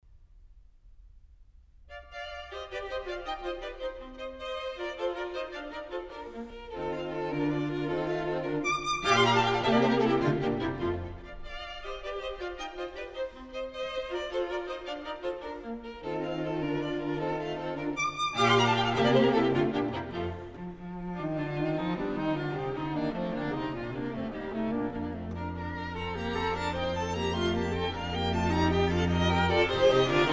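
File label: String Quartet No 22 in B Flat Allegro; Wolfgang Amadeus Mozart; Mozart String Quartets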